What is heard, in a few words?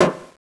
tong